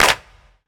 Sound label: short reverb hand clap sample d sharp key